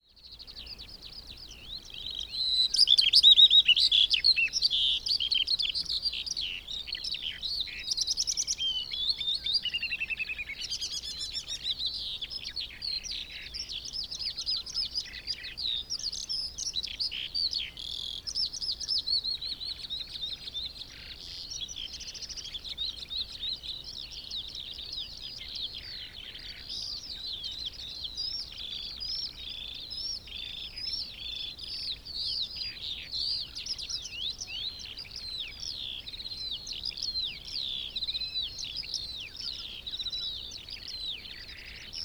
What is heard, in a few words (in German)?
feldlerche, vogelstimmen; karl-heinz frommolt; deutsches tierstimmenarchiv